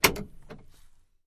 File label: Camaro Power Lock, chevy camaro